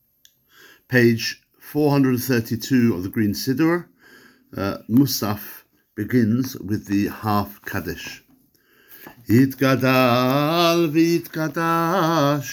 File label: Kaddish before Mussaf; Musaf